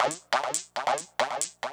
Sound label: Synth, Breakbeat